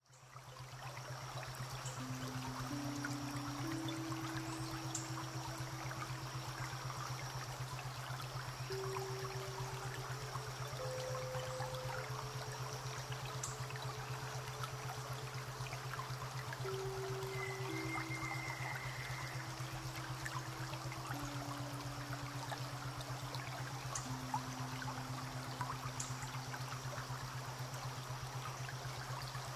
Bali Zen Isochronic

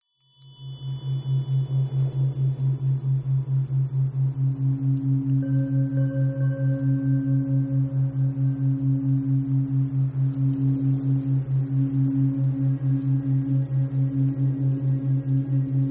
Tibetan Spirit Binaural

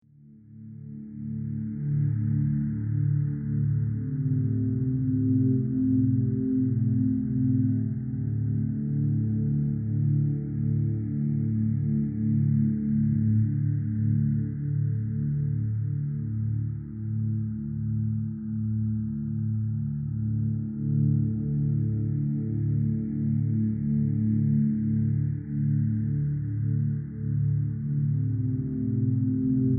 Mountain Call

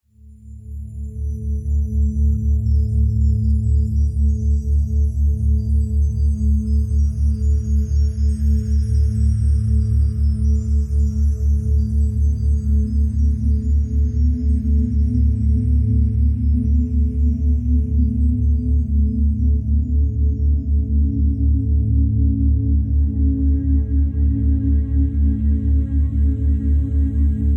Astral Travel Binaural

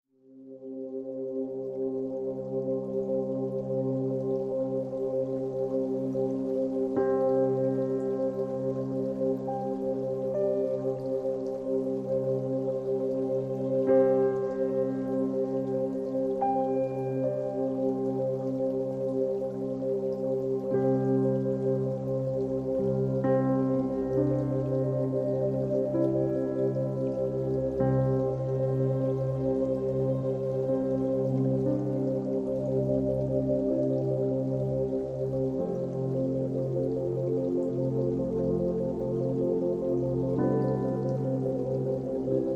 Peaceful Moment Isochronic

